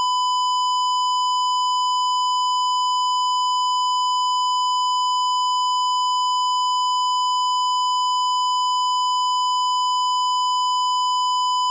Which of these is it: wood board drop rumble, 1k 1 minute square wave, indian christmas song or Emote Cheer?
1k 1 minute square wave